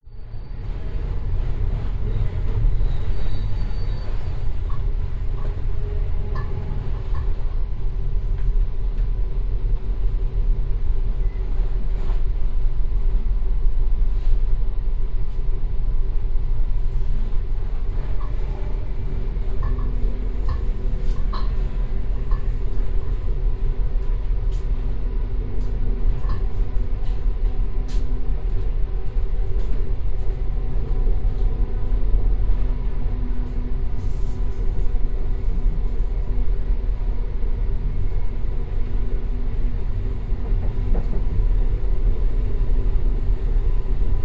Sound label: vehicles train internal pov moving qld rail